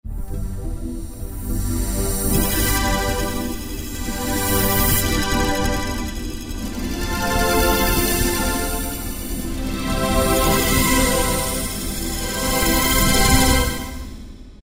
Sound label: Zoom, Yamaha TG500